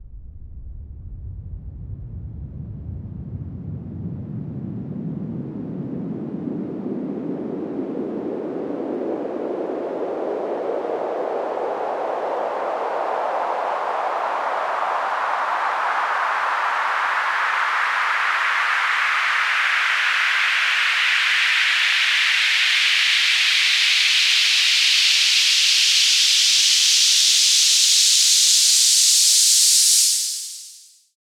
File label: Long Sweep, White Noise